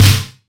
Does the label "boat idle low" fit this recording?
No